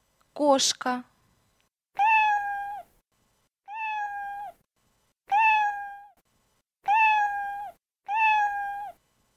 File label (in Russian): Кошка